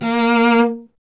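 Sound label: strings cello A#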